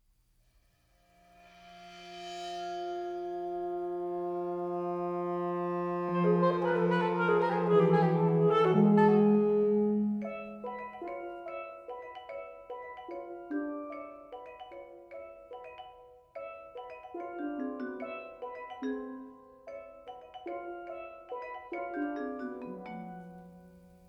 Mottled March

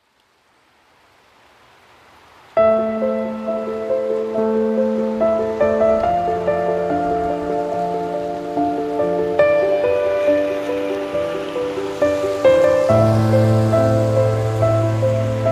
Piano and Rain

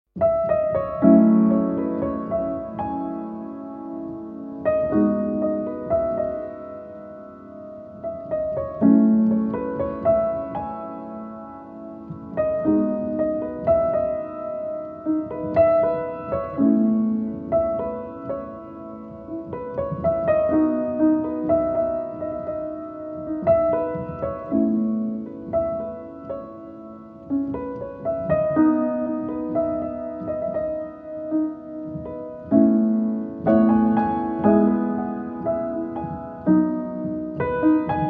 Relaxing Piano Music

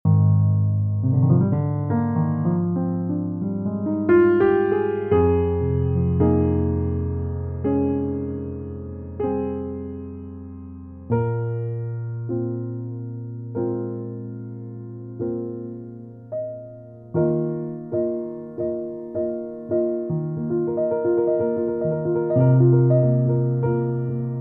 Jazz Instrumental Chill

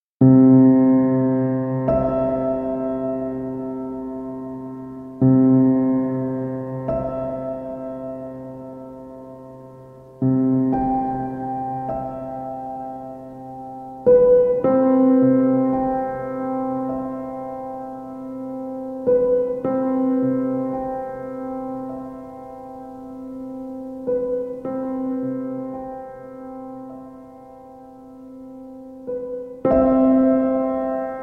Piano Sounds

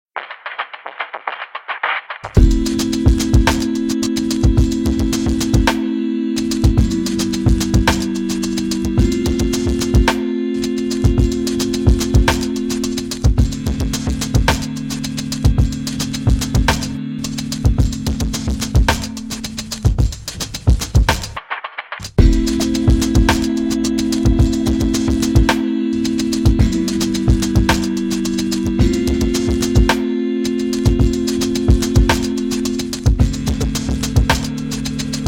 Instrumental Version

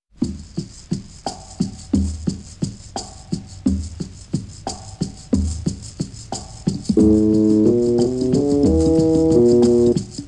Organ Rounds; Moondog

